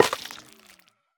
幽匿催发体破坏声

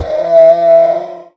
驴死亡声

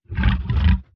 僵尸疣猪兽待机声

